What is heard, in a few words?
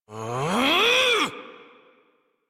Exert Adult, Melee; Warframe; Duviri; Drifter; en